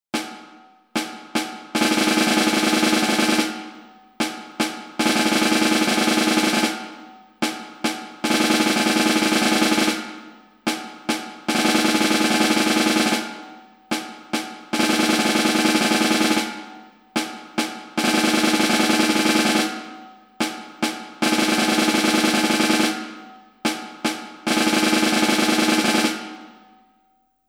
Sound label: snare